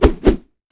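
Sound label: armadillo basic attack; rammus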